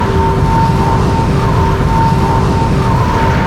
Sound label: base r loop; karma base